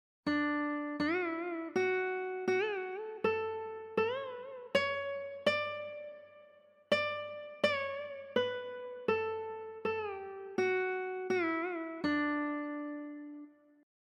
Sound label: Carnatic